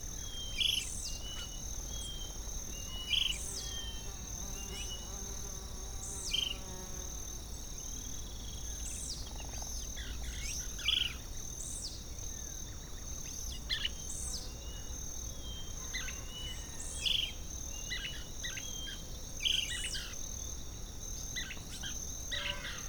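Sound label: Bolivia Amazonia Riberalta Farm Ambience Exterior; Pachamedias; Bolivia Nature Vol.1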